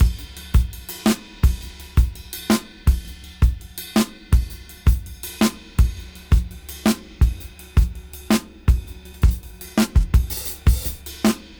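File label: Funkragga 82 BPM break Reverse; AMG - Keith LeBlanc Phat Butt Jams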